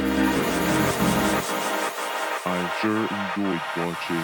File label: Pad With Mumbles; BB 2HTC Samples Vol 4 Addendum; Weird